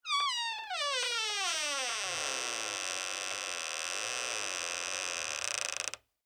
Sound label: Door Squeak, Foley, Door, Squeak, Wood; Creaky Doors